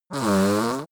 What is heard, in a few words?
yeti nose blow short; kreaturen